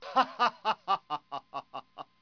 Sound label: people